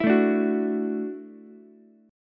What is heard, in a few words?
F sharp Minor 7th Sharp 5th F sharp, Ukulele; strummed; JGuitar